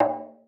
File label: Rebana